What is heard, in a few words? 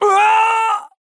pain death; Trapper